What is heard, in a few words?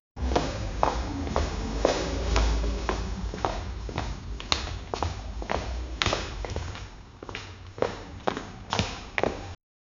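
people walking moving around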